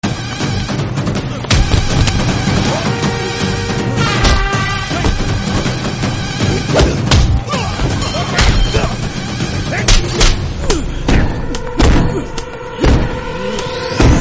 fight scene Bgm music; vijay; Policeodu; Telugu

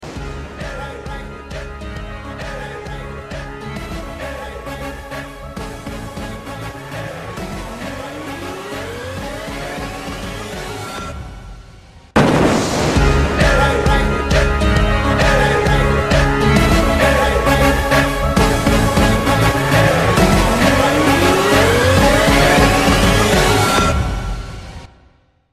Tamil BGM Ringtones; Sarkar

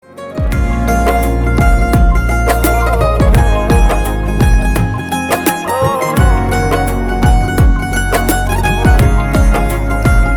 Instrumental

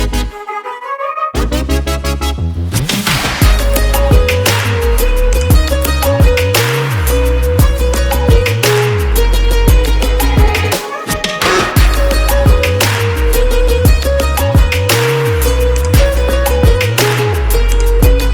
Female Version Instrumental

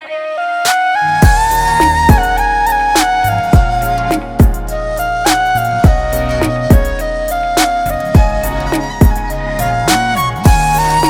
Instrumental Ringtone

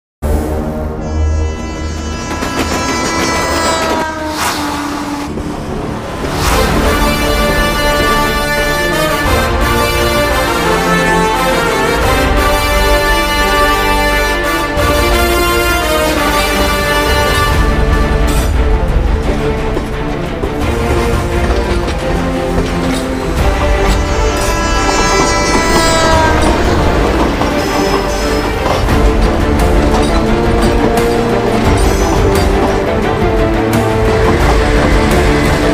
Train Scene Bgm Ringtone; Mufti; Kannada

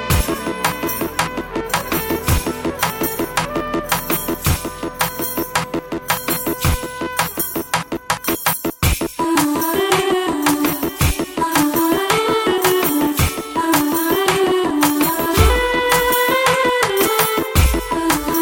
Beats Ringtone; Panjaa; Telugu

